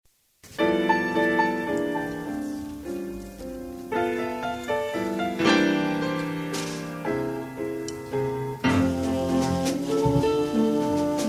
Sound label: jazz ensemble mazurka